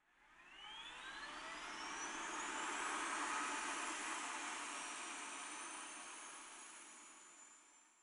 F-86 Starter; DCS

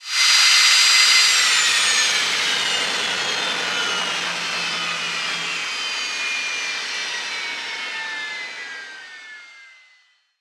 turbine stop, tanks; War Thunder

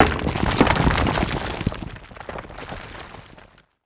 stone gib; Rust

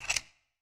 slide in; War Thunder

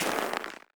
Personnel Footsteps Snow; DCS

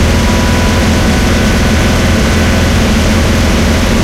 panzer4 engine int rpm 66 load, tanks; War Thunder

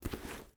ui pickup leather; Rust

